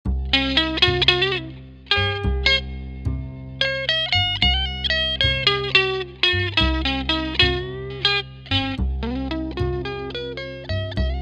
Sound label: treble bleed